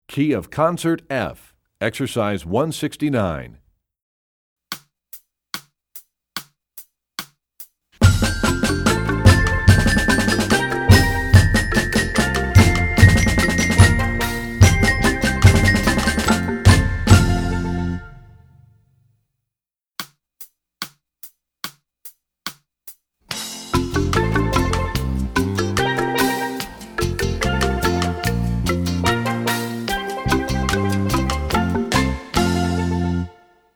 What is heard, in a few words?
Studies Concert F, Percussion; Rubank; EE2000 Book2